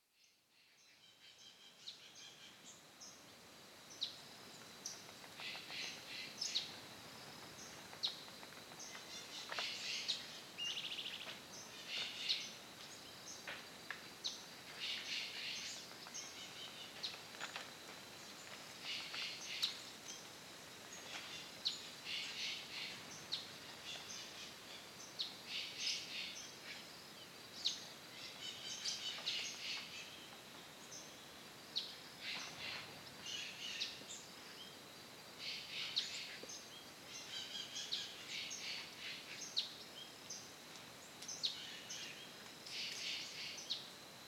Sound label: Winter Morning, It is an early August morning. There is some wind and the sky is cloudy.; Winter Morning in the Atlantic Forest; Veronica Cerrotta; Wind Is the Original Radio